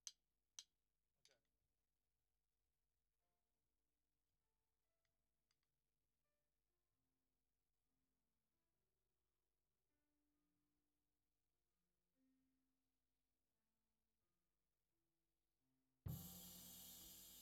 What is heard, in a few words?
Floor